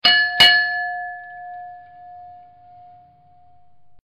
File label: start; LaserWarsSounds